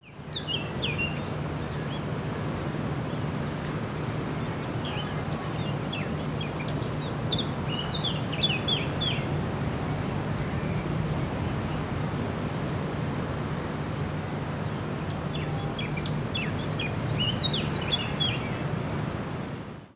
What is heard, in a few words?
Blackcap